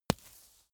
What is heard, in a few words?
stone impact medium; natur-tiere; steine